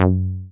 SYN 303, Roland TB-303

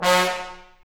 BRS TBN STA, Bone Sec.FX; Roland LCDP06 Brass Sections

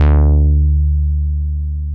MOOG1 BASS D2

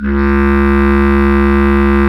Bs Clarinet, Orchestral Winds; Lo Clarinets; Roland LCDP04

